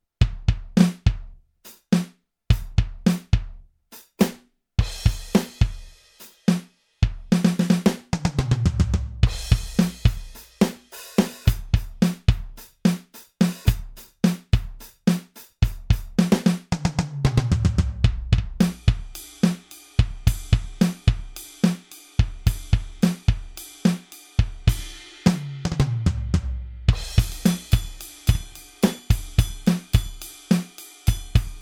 Millenium MPS 750 Pop Kit

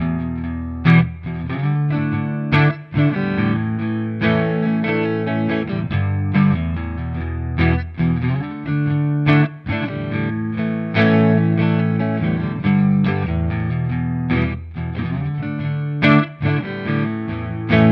Harley Benton clean neck

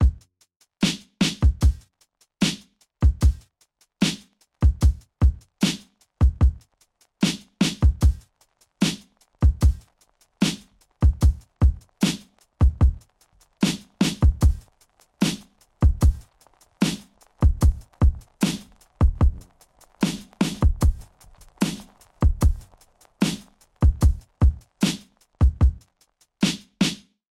Dope Vinyl Drive Wheel

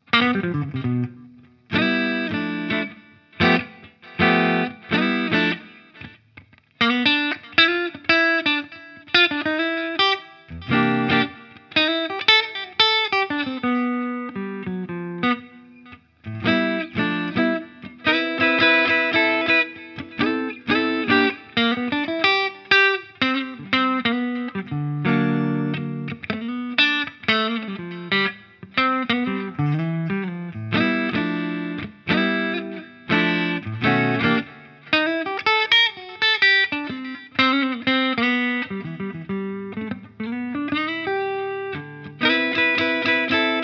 Custom 22 Hals clean; PRS S2

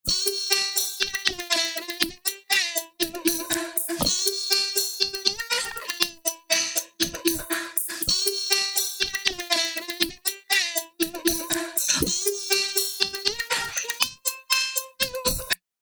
Drumbeat

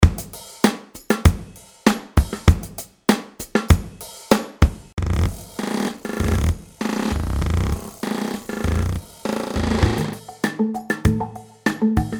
Delays; DSP 2

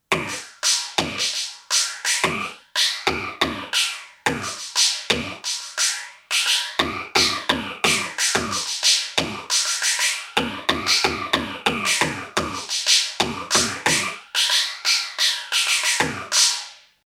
Roland TM 2 Phasin Elec

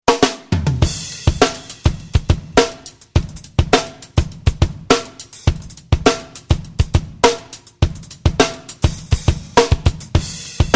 Drums Tube Stage

